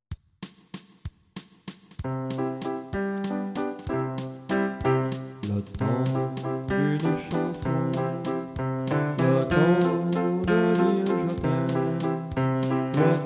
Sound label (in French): sop, chants